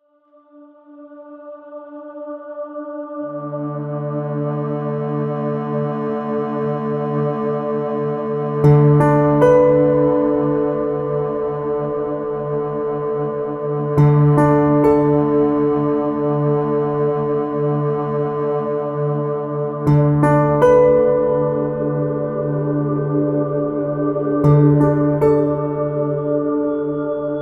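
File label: Pad Piano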